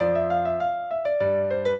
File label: piano; Mozart